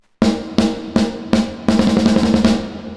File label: Verb low 12dB; PhoenixVerb